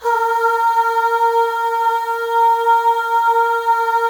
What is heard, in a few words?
Voices, FEM AHS; Spectrasonics; FEM.1 AHS